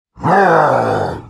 Mob attack

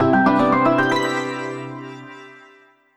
Home Menu Banner; Picross e collection 2011-2018

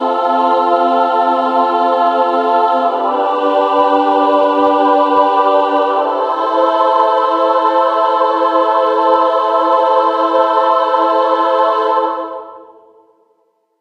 Heavenly Choir

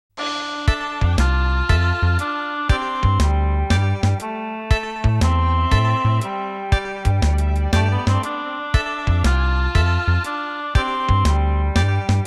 Promotion; koei original bgm collection volume 12; sangokushi iv

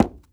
run wood

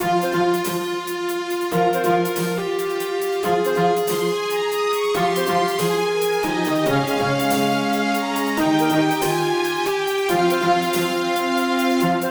Guild Raid

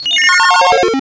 Powershot Recharged; Mr. Do!; SNES

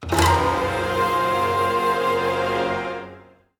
Win (Jingle); labyrinth of zangetsu soundtrack ps4 switch windows gamerip 2022

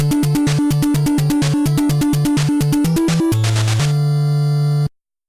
All Clear; Castlevania

